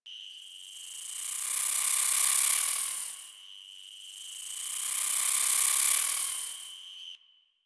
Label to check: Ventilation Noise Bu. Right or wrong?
Wrong